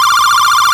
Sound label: Misc Score; Angry Birds Star Wars 2